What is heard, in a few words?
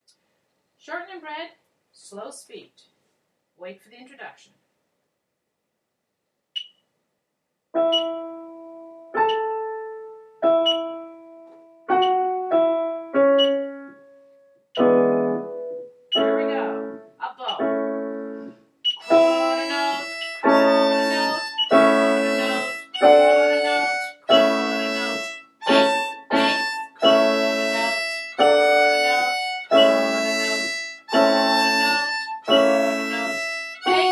Sh'ning Bread slow, Strings Practice Recordings; Beginner